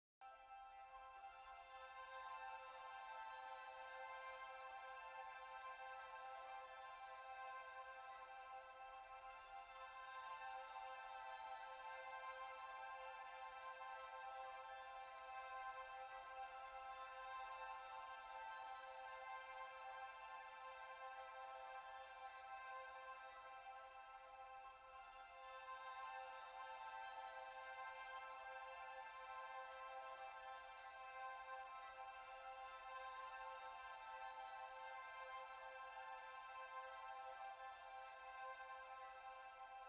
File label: Last Walk Ambient Loop